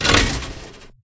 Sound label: Mechanicals big